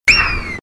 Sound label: a wizz